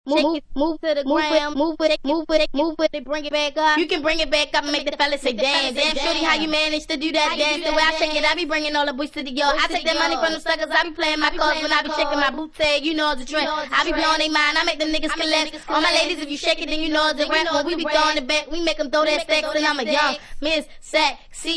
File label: acapella